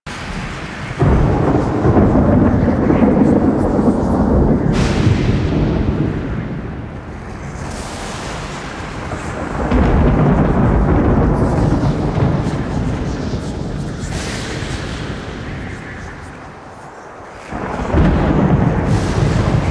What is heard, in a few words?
Void Storm Distant Loop; Warframe; Railjack